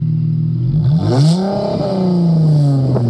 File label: V6 Charger